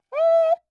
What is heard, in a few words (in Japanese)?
擦奏 クイーカ; 膜鳴楽器; cuica長